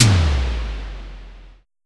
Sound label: Drum X Tom, Pearl